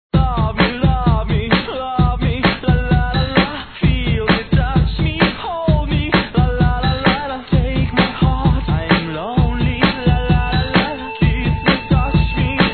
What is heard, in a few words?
guitar remix